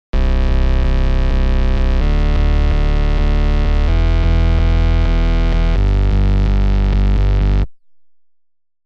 Bass

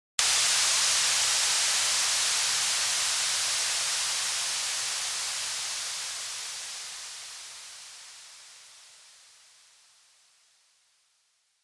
Downlifter

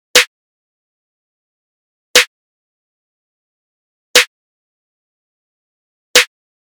Snare Dry

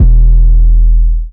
808 E; Cymatics; Cobra

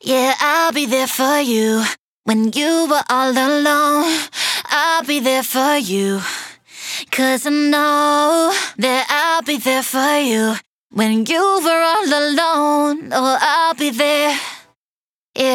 vocal hook dry lead 110 Am

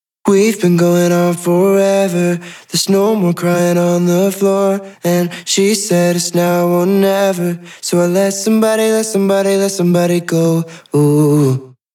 slap delay on

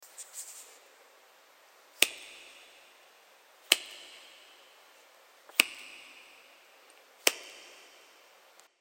Snap EQ Overdrive Reverb stereo

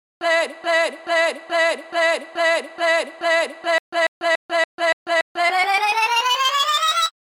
Buildup Pitch

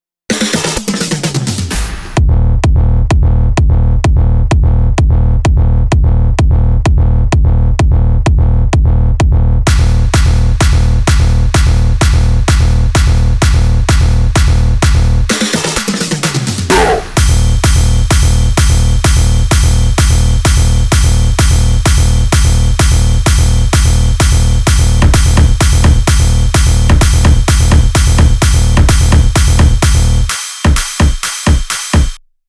All Drums

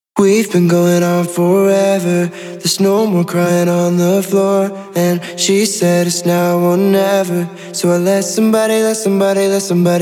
Slapback Delay Reverb Synced Delay Reverb

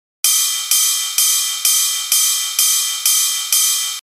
Ride

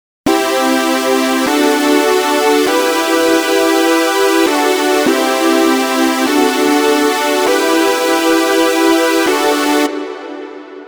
Chords

